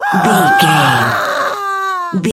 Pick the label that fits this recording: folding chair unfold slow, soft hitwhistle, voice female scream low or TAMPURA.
voice female scream low